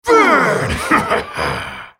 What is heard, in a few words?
spell cast effort; Brand